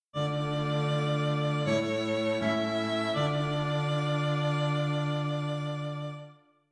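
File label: string quartet, dictation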